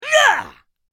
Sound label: Mobile game character attack shout; video game